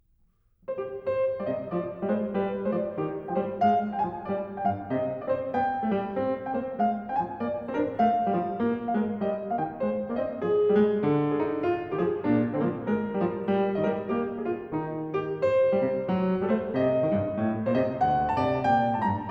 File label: French Suite No.2 in C minor Gigue; András Schiff; BWV 813 VII